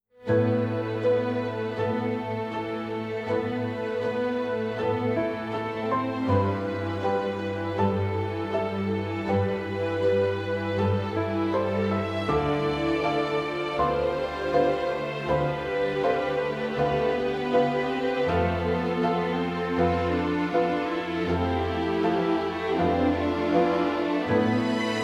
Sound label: dramatic; by burak sound bank; dramatic dram vol 13